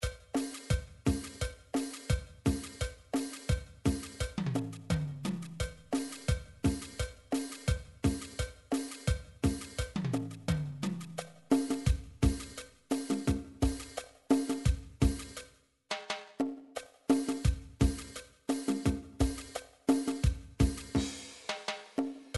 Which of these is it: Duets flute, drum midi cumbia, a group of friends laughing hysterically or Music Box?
drum midi cumbia